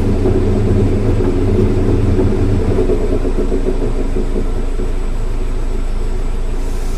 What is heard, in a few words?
rear noise trunk stands kick neutral; rear noise id; s2000; under hood